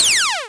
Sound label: close